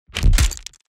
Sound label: SMACK